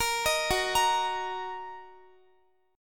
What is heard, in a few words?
Gb 6th, Guitar; Gb6 arpeggiated